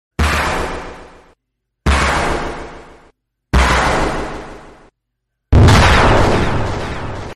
save shatter; flowey

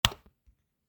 click sound; gd

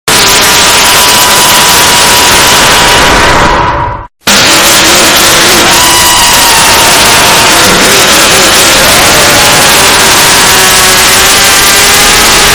erraped scream

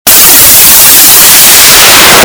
jumpscare; quiet rush

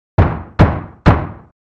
gavel banging

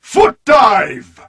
foot dive

